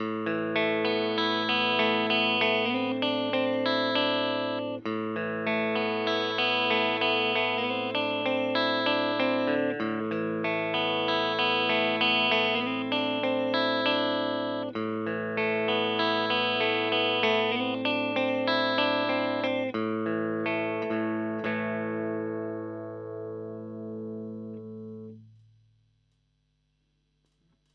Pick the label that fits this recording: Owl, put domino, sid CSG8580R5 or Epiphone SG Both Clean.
Epiphone SG Both Clean